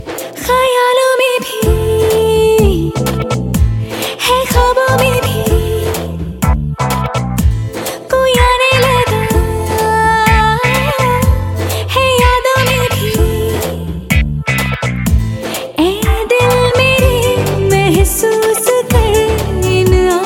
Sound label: Best Romantic Female Hindi Ringtone